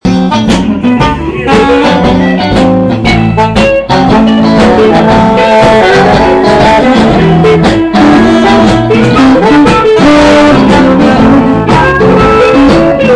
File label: band